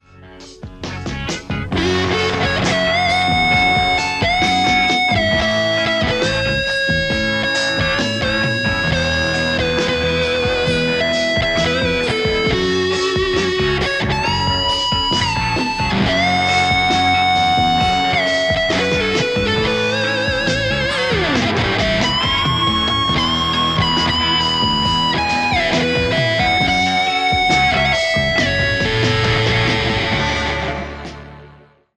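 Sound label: Stratocaster; igniteamps theanvil v2 01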